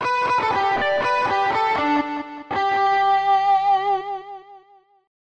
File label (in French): Carillon